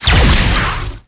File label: ball orchid; storm spirit